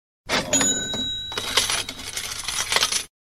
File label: Sale Sound Effect; Discount; DEPOP